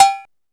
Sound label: SP1200; DRUMMACHINES